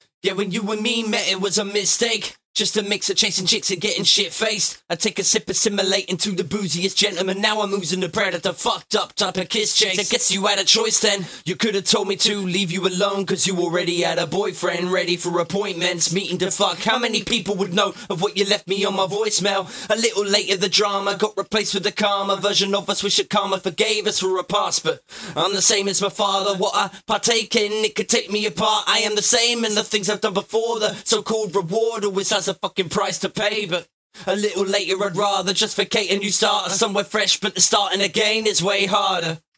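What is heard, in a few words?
hip hop, 100, C minor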